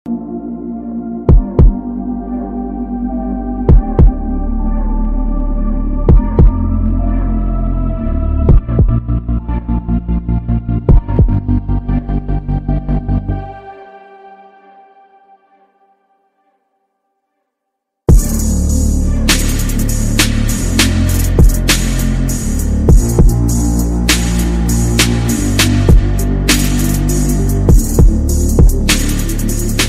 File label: Alone Mood Off Ringtone